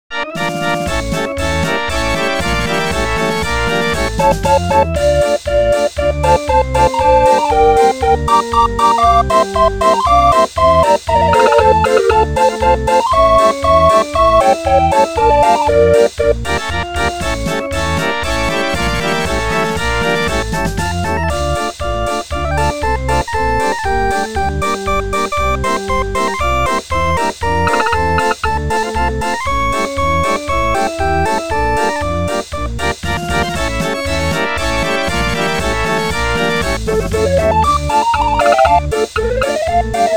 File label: barrel organs; 52 Key; Music Books; Thomas Sterk Arrangementen; Music rolls